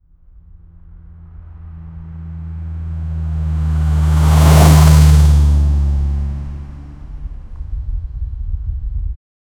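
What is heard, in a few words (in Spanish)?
sonido de un un vehículo, car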